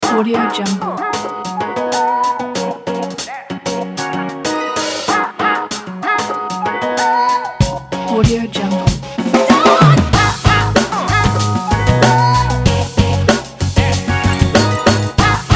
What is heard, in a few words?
hiphop funk; aveoaudio